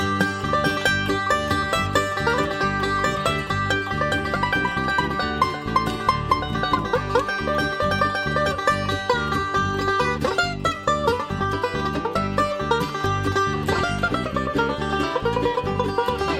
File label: John Henry, banjo; Banjo Encyclopedia